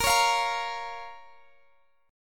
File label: Bb Minor, Guitar, Open D; x,x,20,19,20,20